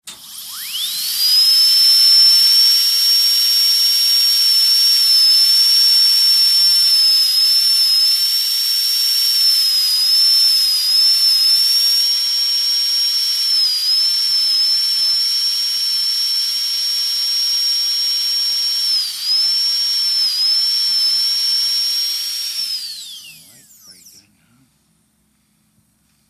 HOSPITAL EQUIPMENT DENTIST: High speed dentist drill.